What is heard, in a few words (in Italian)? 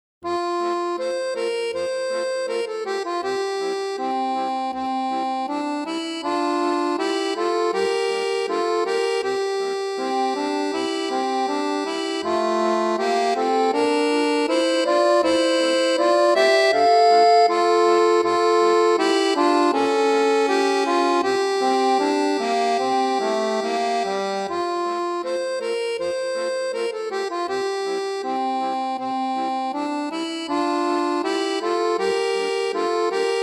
ELEGIA Solo; mini suite